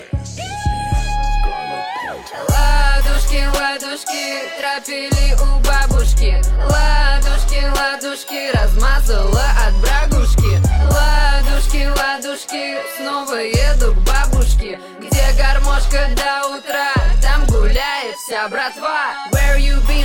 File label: Russian trap